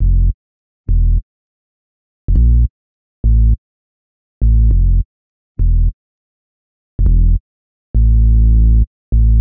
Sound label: Bass, 102 Fmin